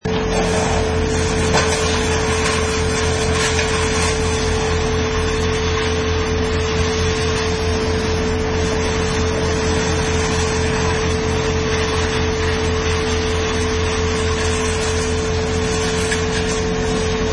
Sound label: Wood Chipper